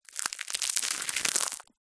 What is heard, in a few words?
food ice cream cone crush in hand; zapsplat